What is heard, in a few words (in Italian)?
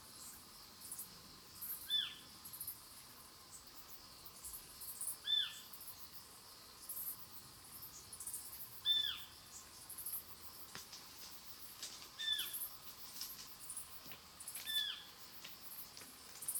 canto verso allarme assiolo otus scops owl petit duc-scops autillo europeo zwergohreule